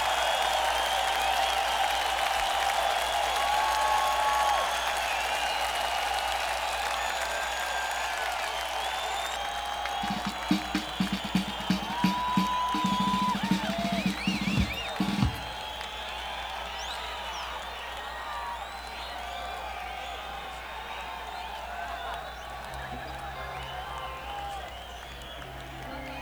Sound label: Encore Break